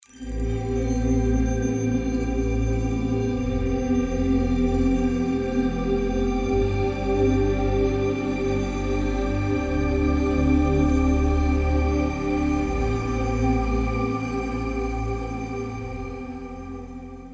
Future Bass Chord Pad All

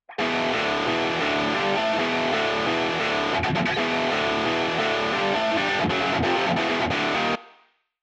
guitar pattern 2 gt

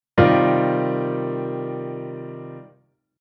Chord Poly; Jissen

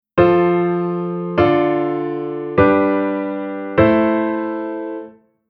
Chord S S D T

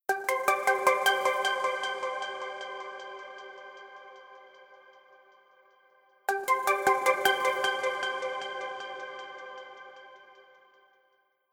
Future Bass Melo Pluck Lead

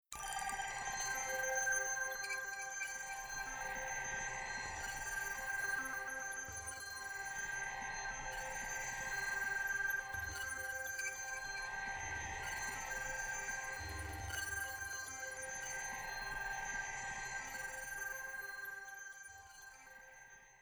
Future Bass Chord Pad Texture EQ Off

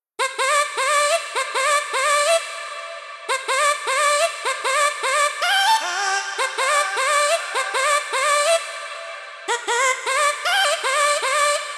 Future Bass Melo Vo Chop Reverb EQ On

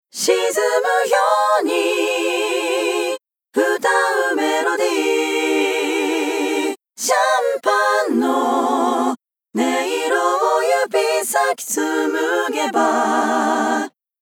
Mix Cho Bus EQ On

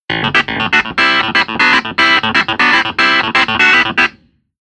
keyboard clavi damp

